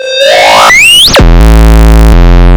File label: fx disto, big beat; Big Beat Samples & More; AKAI; DISTOTHER KT